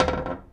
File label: Toilet Lid Open; SFX Kit; Foley - Misc